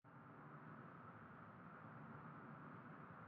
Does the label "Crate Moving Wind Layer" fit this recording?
Yes